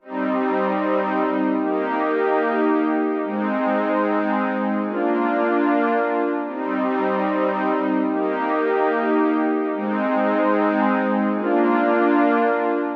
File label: Synth